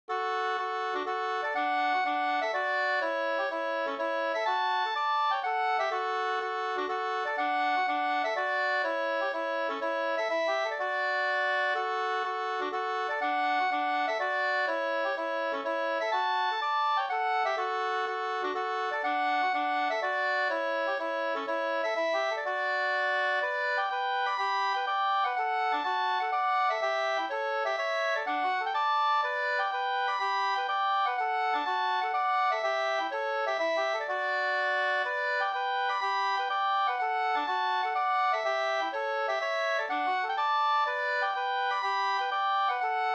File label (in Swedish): hamburska; Kjula Sd